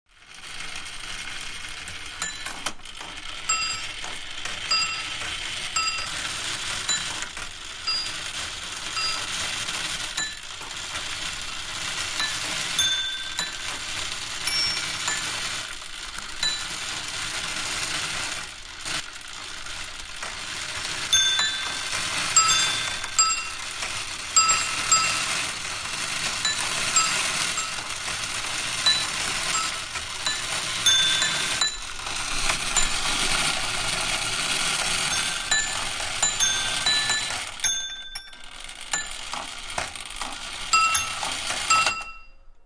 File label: Clock Chimes; Clock 11 Chimes Black